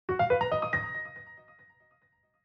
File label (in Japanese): 謎か解けた; 謎が解けた時の効果音②